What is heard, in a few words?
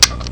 shutter, cameras